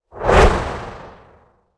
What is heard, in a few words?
success